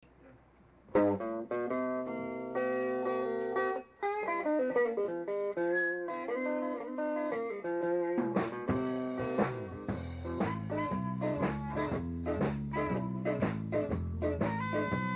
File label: Blues